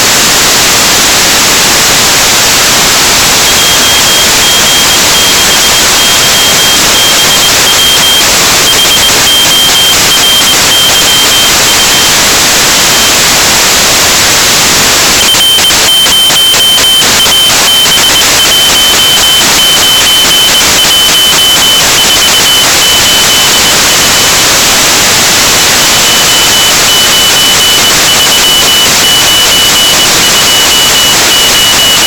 CW; Horyu-4; JE9PEL

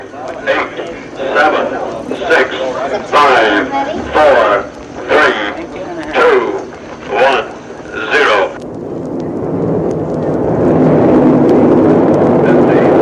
count-down and launch Explorer 1

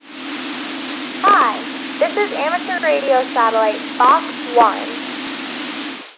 digital voice; AIRSPY

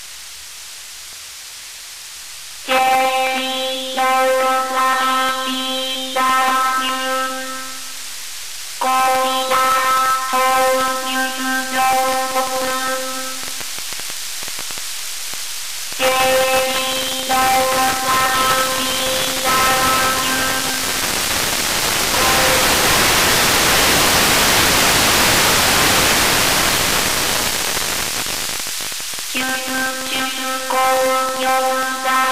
Digi Singer Japanese national anthem Kimigayo; HORYU-4; JE9PEL

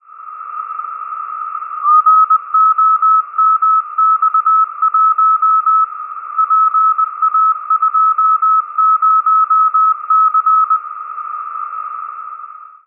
CW; GOMX1; AIRSPY

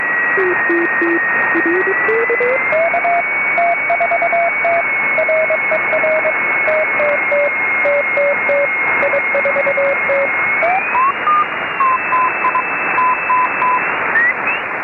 cw beacon 145mhz728; xw-2b

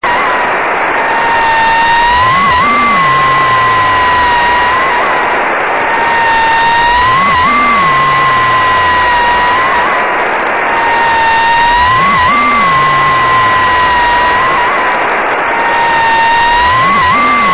260 mhz; ERS 20

